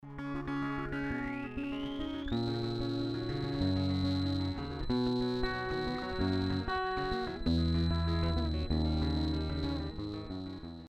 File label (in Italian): acustica sweep, freqbox moogerfooger; Chitarra acustica Martin DC1e; VCO: 0 SYNC: si WAVE:tri ENV AMT: 0 FM AMT: 0 MIX 5 con cavo ENV OUT-ENV AMT IN e pedale espressione; MF-107